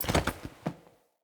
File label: Grunt Foley Body Fall; Enemy; Sinecure; Melee Grunt